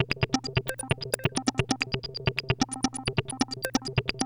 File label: NOISE, SYNTH SEQ; AMG; Global Trance Mission VOL-2